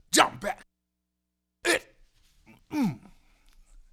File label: Vocal, 70s Analog Funk; Sonic Foundry (Sony Creative Software); What it Is! 70s Analog Funk